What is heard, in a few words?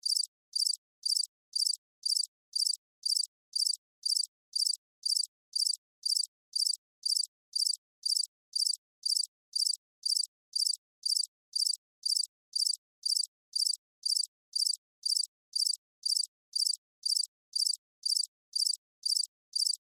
Crickets Chirp, Crickets, Chirp, Ambience, Night, Insect, Bug; Animals:Insects; Blastwave